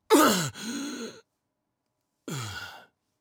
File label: Voices male death grunt